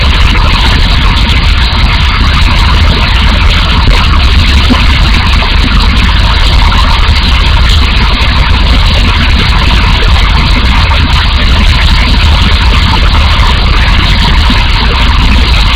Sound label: waterfall; Minecraft; loops